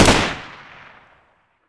sg550 fire; garrysmod

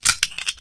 m4a1 silencer on; garrysmod

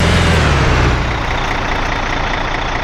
slowdown, truck; vehicles; garrysmod

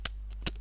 m60 feeding tray; mg; garrysmod

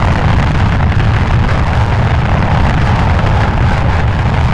srb idle; garrysmod; gbombs

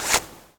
cloth, weapons; garrysmod

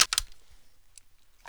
m249 lid open; mg; garrysmod

